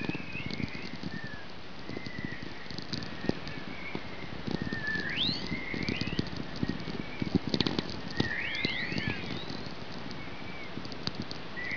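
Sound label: halley chorus example, sounds of space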